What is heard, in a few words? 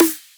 Drum Snare